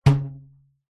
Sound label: foley rubber band ping; zapsplat